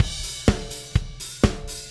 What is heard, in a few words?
Drums, Rock